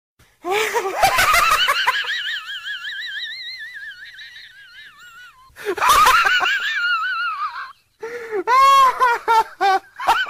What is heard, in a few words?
Funny Laughing